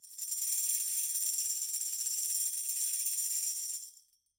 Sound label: fill; souichirow adachi works